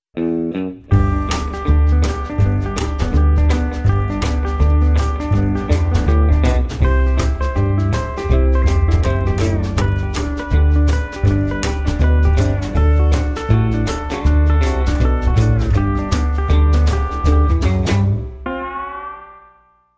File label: country picking ringtone